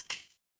glass crack

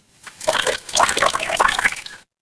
water pour

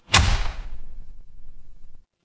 door open

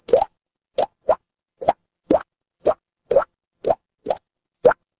water drip

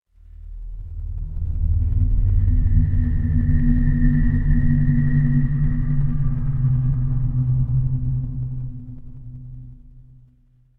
horror drone

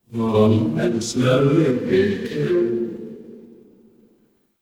crowd murmur

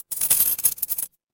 impact coins

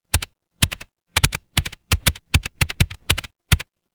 office keyboard

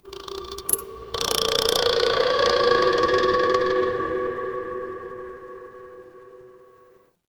horror creak

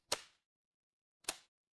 ui toggle

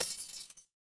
glass shatter

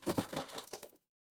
impact crash

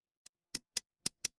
glass glass tap

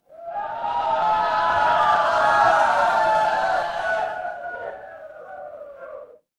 crowd cheer

